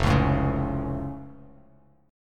F Augmented, Bass Standard; strummed

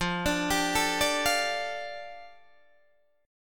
F 6th Add 9th F down Guitar Open D, chord

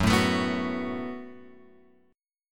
F sharp Minor Double Flat 5th F sharp; F#mbb5 Chord {2 0 X 2 0 2} strummed

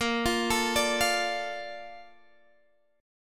Bb Major 7th, Bb, down, Guitar, Standard; BbM7 arpeggiated; JGuitar

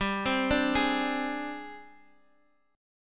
Ab Major 7th Flat 5th G, Guitar

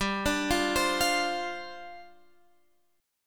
G 7th Suspended 4th G; arpeggiated; x,10,12,10,13,13

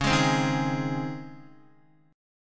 Db Major 7th Suspended 2nd, Guitar; x,4,6,5,3,3; 4E,4A,5D,5G,5C,6F; strummed; chord shape

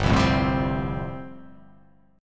G 9th Suspended 4th

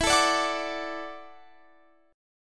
Gb Minor 7th Sharp 5th, Guitar

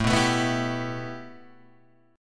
A 7th Suspended 2nd Guitar Open G

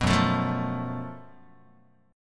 Gb Minor Major 7th Double Flat 5th Gb Guitar; strummed; 2,2,0,3,1,x; JGuitar

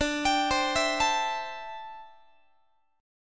D 9th Suspended 4th D down Guitar; arpeggiated; JGuitar

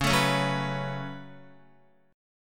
Db 7th Suspended 4th Sharp 5th Db down Guitar

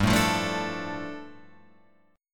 G 7th Suspended 2nd, Guitar Open F; strummed; JGuitar

